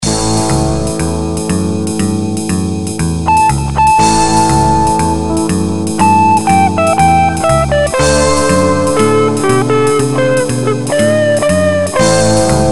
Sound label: Blues